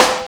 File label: AFRICAN SN; E-MU Formula 4000 Series Vol. 2; Dance Set 2